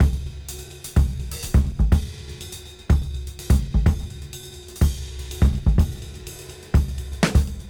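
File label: Disk 125 BPM Cymbal; AMG - Keith LeBlanc Phat Butt Jams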